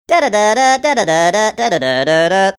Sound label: singing nokia ringtone sped up